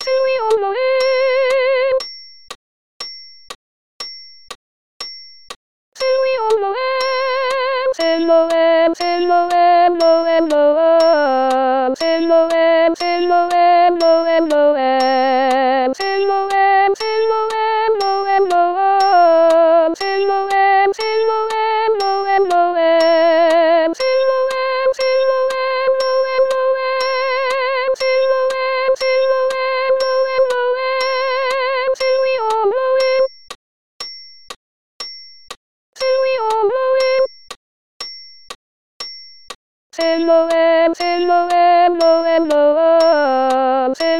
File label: african noel